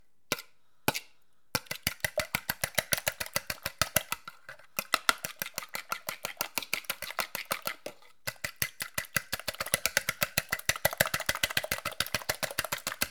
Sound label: Whisking Egg